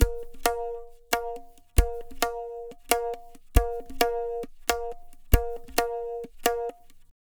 Tabla, 135 BPM; Zero-G; Pure Tabla

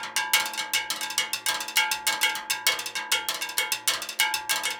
100 STEEL, Percussion Loops

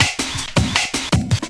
LOOP L, Drum & Bass 160 BPM

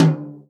tom, Real Drum Kit, jungle kit

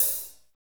HAT OH, Jazz; Northstar - Drumscapes Roland

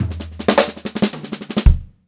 Funk Groove Drumloops; USB Soundscan vol.38; AKAI; 90 s Sample CDs

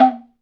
TUZUMI B1; AKAI S-Series CD-ROM Sound Library VOL-5

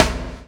CHAIN; Spectrasonics - Backbeat

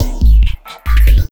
Bumpers; 808FLG Fx; Sonic Foundry (Sony Creative Software); Esoterik Beatz

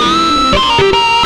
xtra lead

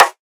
drum rim proper; Virtual Riot; Drum Hits

